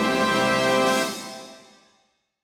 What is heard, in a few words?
Tada; LOOPERS